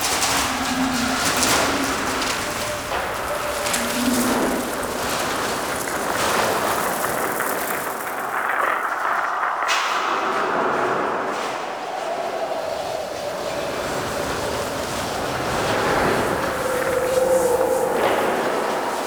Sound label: Gas City Large Enclosed Exterior Wind Rattle; Warframe; Corpus